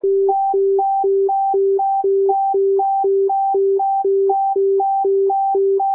low high

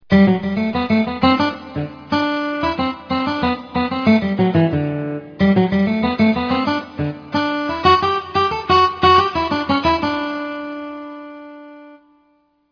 instrument

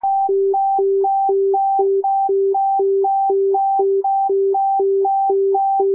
high low

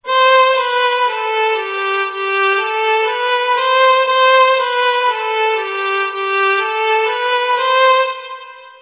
high scale

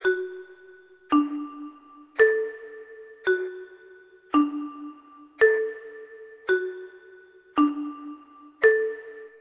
marimba stream

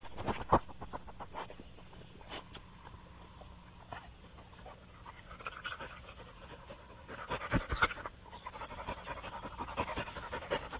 sniffing

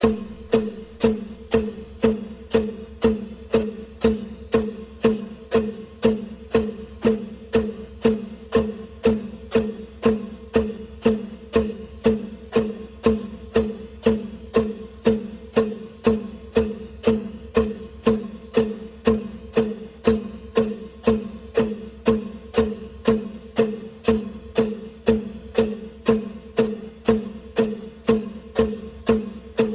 combined hand drum

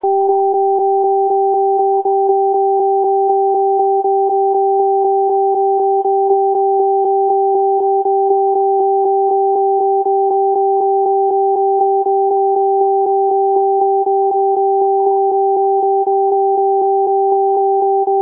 combined sine

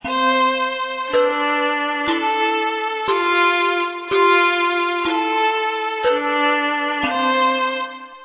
slower

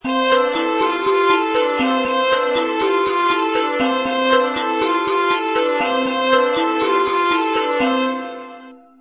faster

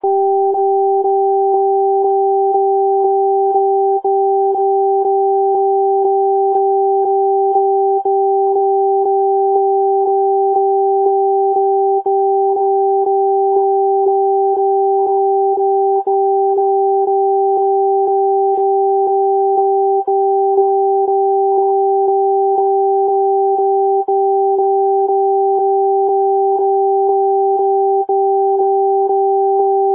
combined sine

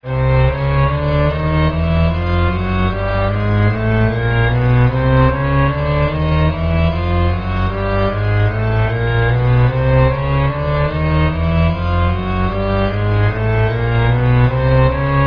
shepard slow

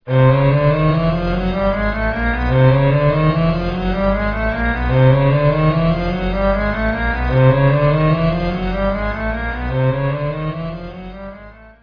shepard fast

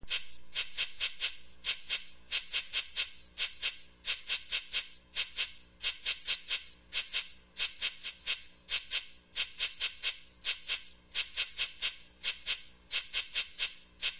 instrument

